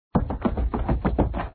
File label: Run